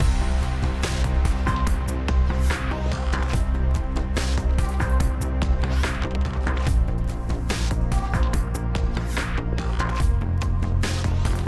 Exploration, 72BPM

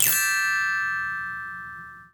Dress Success; the princess and the frog; Diner; Dress Tiana

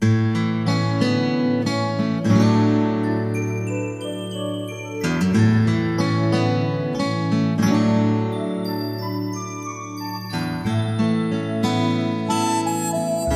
BGM; Adventure Player; psp

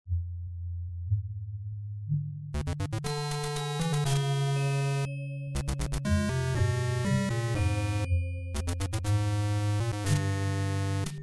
dungeon; Android

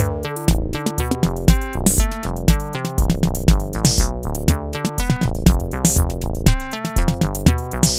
Cartoon

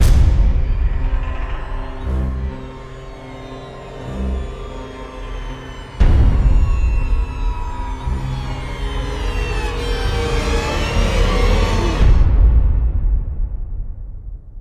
Player Died; I Shall Remain

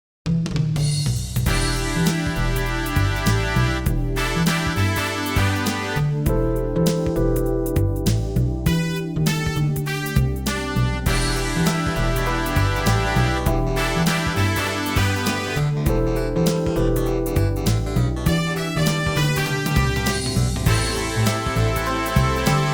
Tense Scene

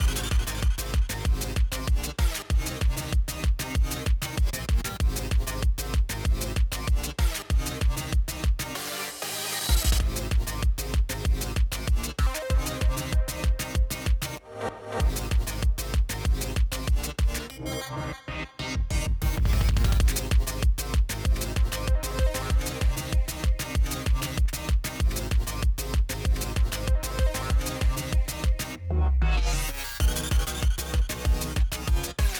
Rave Loop

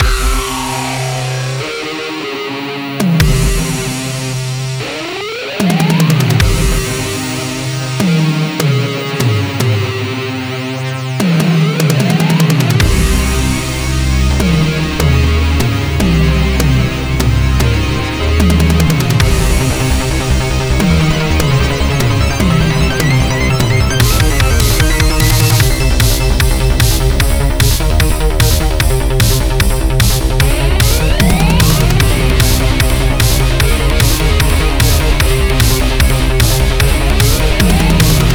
Rampage Loop Full

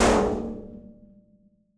metal hit

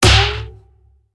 Hit Melee Blow

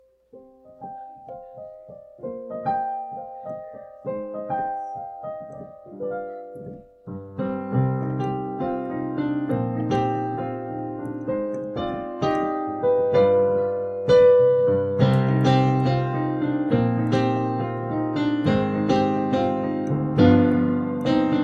Piano; Burning Knight

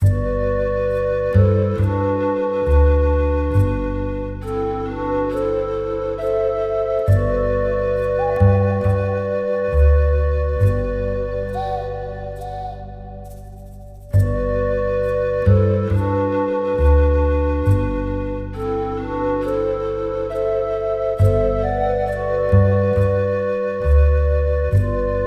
BGM Village In Forest

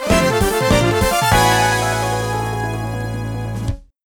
(Jingle) Finish!; Excitebike World Rally

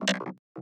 robot idle

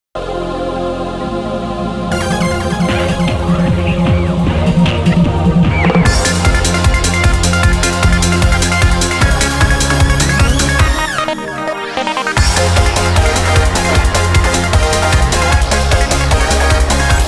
Eurobeat version; Dance Dance Revolution Disney's Rave

